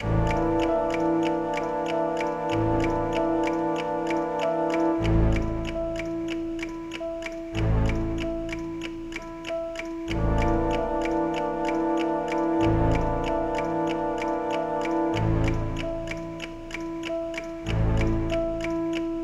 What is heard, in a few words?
Tension; Schlag den Star